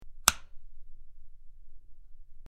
Switch Big Metal Ligh, switch clicks

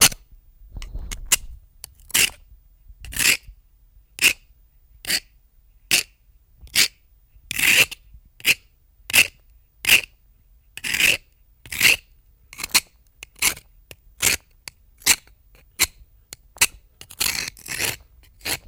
Knife Scraping On; knives

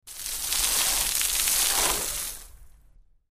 Large Sea Sprays Fall, bow wash

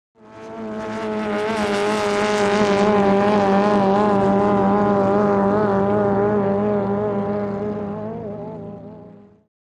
In Outboard Boat By, motorboats

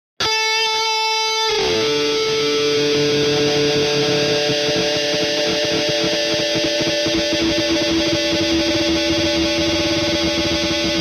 Elect Guitar, electric guitar

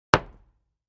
Patio Block Hit Sledg, concrete & brick

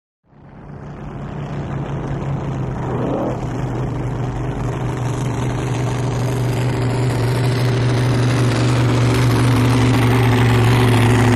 Drag Boat Idle revs

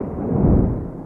Propeller Sngl Whoos, propeller movement

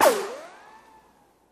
Bullet Ricochet Ban, riccos

